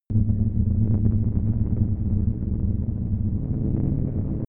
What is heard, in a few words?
space background noise quiet